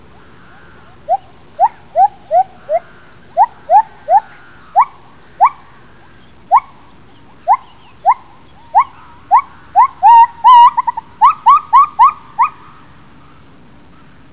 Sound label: Male, gibbons